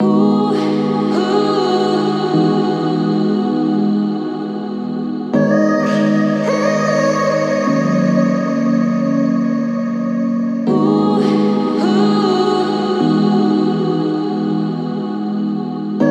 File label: Lofi